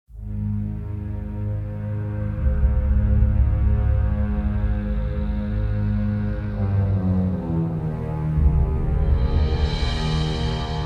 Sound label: 105bpm, Underscore; hartwigmedia